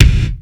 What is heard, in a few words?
KICK, M1-M3R; Greytsounds VOL-1